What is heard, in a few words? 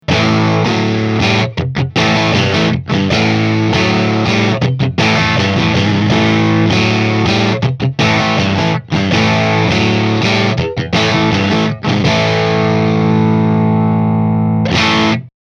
fender 57 deluxe